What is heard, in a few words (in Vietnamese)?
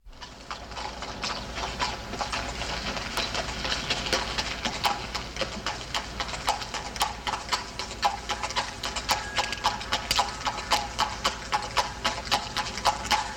tieng xe ngua di qua